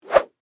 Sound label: Whip Whoosh Coax, Cable; Foley; Sound Effects:Objects; BlastWave